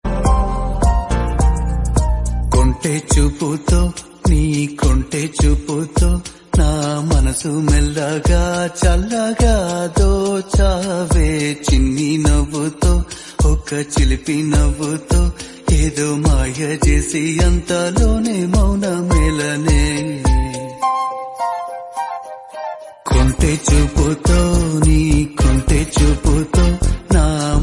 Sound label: Tamil